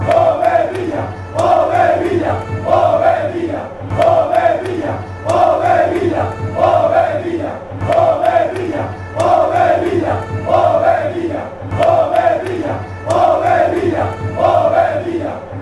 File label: ovedilla fanchants